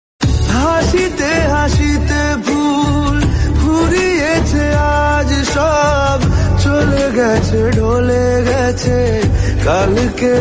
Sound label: BENGALI SONG RINGTONE